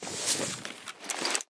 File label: naked, ledge grabbing Vaulting; Ledge Climbing Mantling